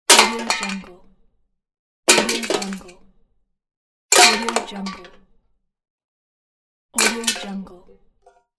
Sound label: single cans falling